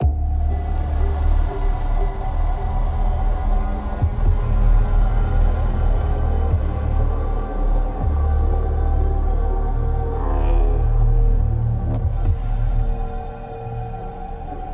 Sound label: countdown 120bpm intro; Doom 2016